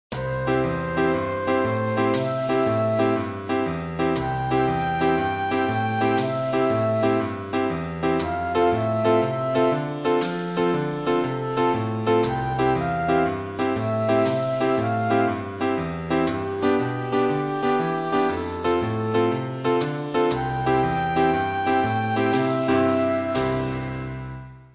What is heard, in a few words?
melody